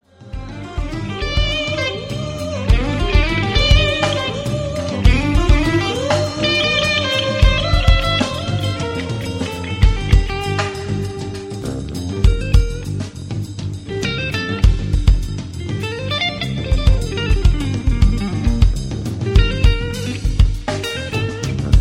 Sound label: childrens jazz; glassharp